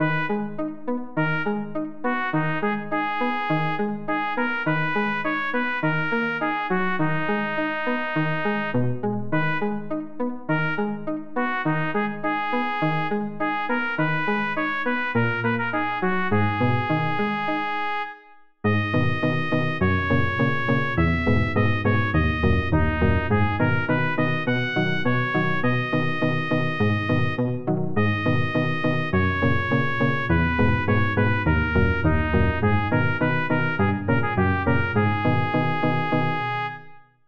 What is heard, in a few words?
Merchant